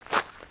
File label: gravel, human step